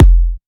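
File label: Kick, F minor; Sample Banks; Percussion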